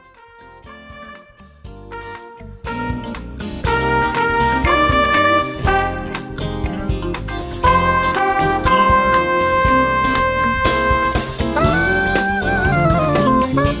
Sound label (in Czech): Filmový jazz